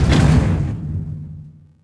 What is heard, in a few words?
pallet hit metal; objects; Star Wars Obi-Wan; XBOX